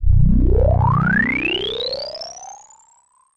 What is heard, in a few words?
synth efx rise